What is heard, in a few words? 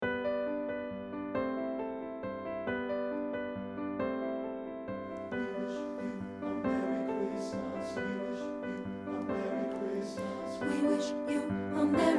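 alto, choral; WE WISH YOU A MERRY CHRISTMAS; partbypart; satb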